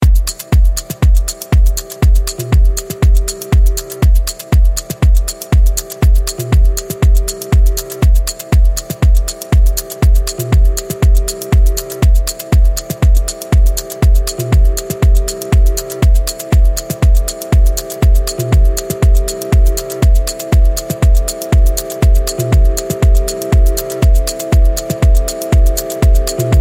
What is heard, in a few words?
Lofi Mix